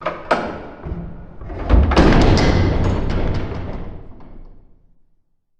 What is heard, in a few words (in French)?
porte